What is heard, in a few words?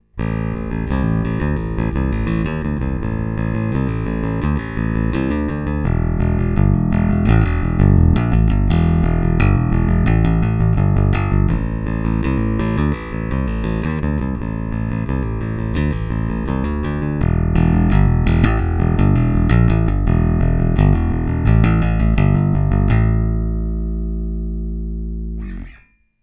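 Bridge active pick, bass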